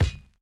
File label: Bounce Sword Large